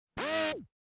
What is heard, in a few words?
Robot arm moving